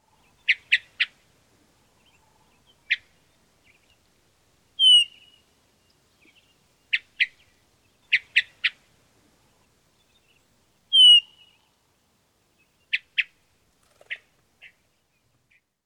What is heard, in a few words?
hermit thrush churt and veee